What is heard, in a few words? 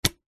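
technology quarter inch phono lead connector pull from speaker; zapsplat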